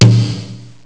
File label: taiko, fareast; instmnts